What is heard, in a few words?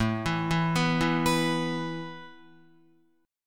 A Suspended 2nd A Guitar; Asus2 arpeggiated